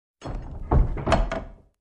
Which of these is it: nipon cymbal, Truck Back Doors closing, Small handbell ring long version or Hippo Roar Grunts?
Truck Back Doors closing